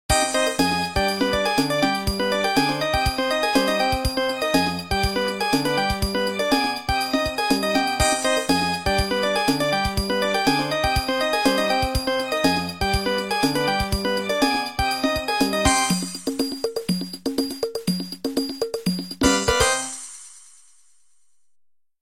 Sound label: motorola latin loops